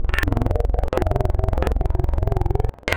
Speaker, wavetables; Waves Codex

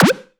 Misc Short FX

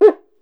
CUICA, Brazil Drum Perc; E-MU Producer Series Vol. 7 – Old World Instruments

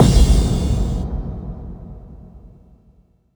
BD-FX, Dance Mega Drums; Best Service

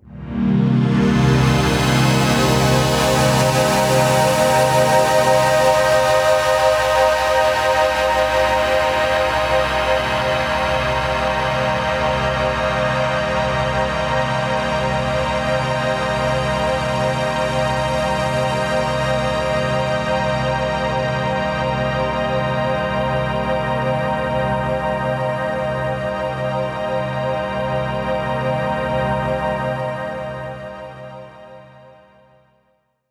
Pad C2, Club Techno; Zero-G

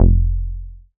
BAS F1, SCI Prophet-5 synth bass

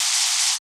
EL ARC, ELECTRICA; Total Control - Action Cutz

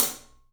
Studio Hats, 3DPercussives

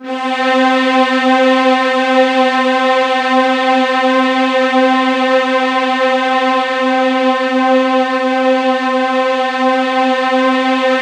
C4, Synth Leads; 90 s Sample CDs; NiceBeats (NiceBytes); Studioline 09

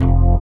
HOUSE, House Dance

